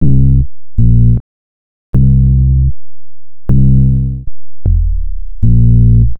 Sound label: Bass, Futurist Drum & Bass